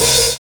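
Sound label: ONLY A hi-hat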